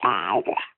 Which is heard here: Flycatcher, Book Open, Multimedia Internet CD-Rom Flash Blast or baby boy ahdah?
baby boy ahdah